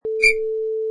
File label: Referee Whistle